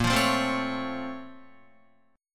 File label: A sharp Minor Major 9th, down, Guitar; A#mM9 strummed; JGuitar